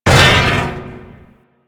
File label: Mech Head Impact; Nechro Mech; Vehicles